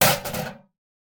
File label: impact trash box; Blind Drive